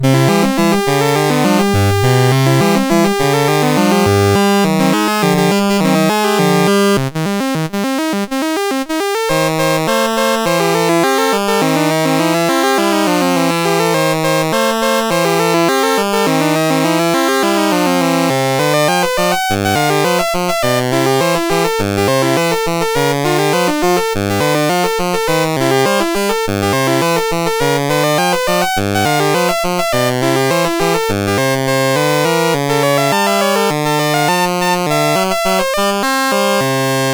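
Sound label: River Chase, MOS 8580 SID chip music, games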